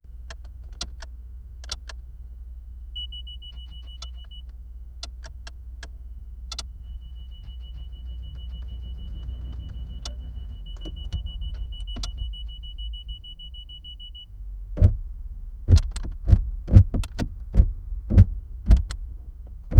the sound of alarms

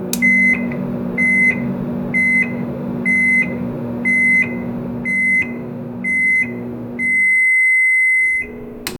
a microwave beeping as it finishes cooking

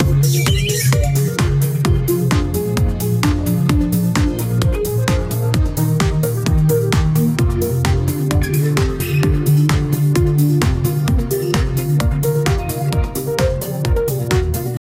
music coming from outside

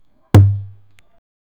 pop toing boom puing

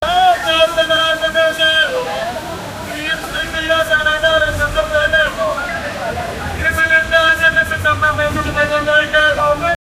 buzzing sounds of a stree

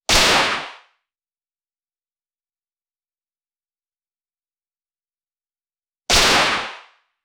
single shoot kar98

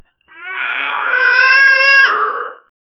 dinosaur mate call

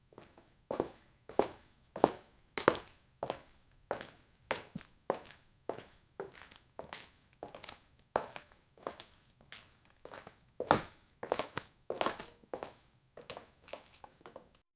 childs footsteps bare fee

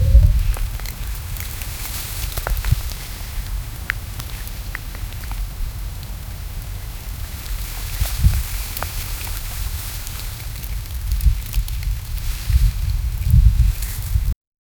soft soothing sound of a

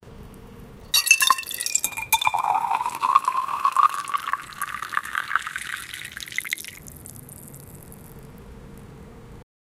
the sound of water being poured into a glass

